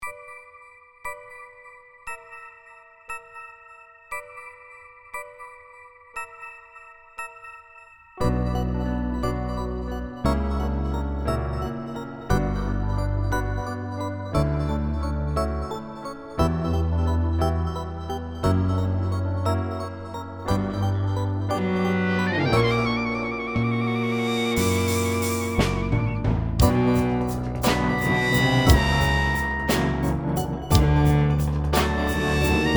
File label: Orchestra